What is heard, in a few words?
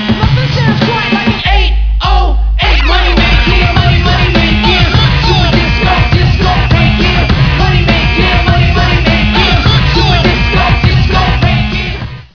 disco